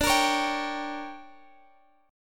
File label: D Major 7th Suspended 4th Sharp 5th, Guitar, Standard; DM7sus4#5; strummed; x,x,12,15,14,15; down; capo=3